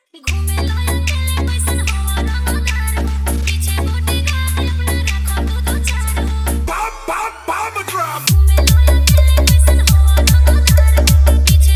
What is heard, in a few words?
Bhojpuri Dj Remix Songs Hard Edm Trance Mix